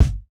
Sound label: kick, house